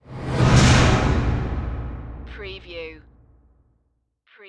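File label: Production Element Whoosh To Hit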